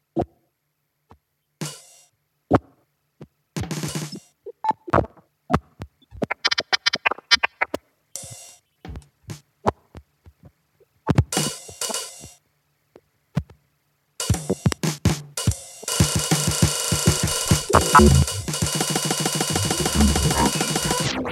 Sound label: drumsolo